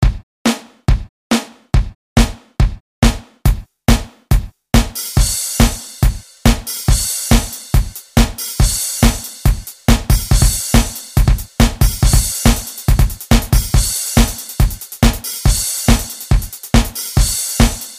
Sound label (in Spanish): Bateria Groove Generator; FerCyborg